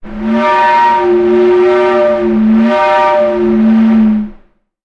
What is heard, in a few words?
sound design effect air pipe pressure